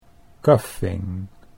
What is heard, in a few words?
coughing